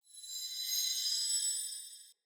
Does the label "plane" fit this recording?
No